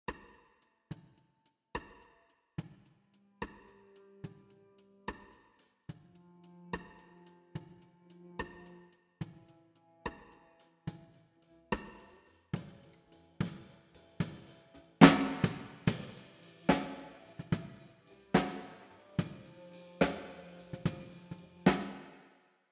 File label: Snare DVR